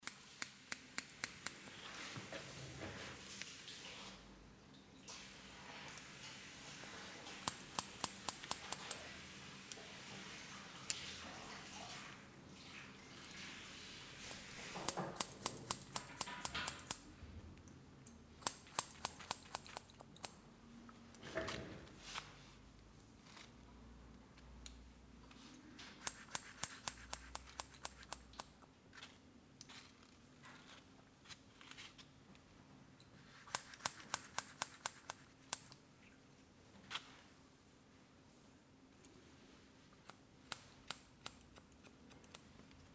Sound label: hair cutting